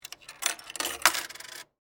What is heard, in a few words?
Arcade Game Insert, Technology, Arcade, Game, Insert, Quarter, Into, Slot; BlastWave